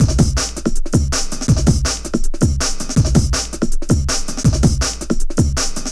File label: 162, breaks, Loops; M8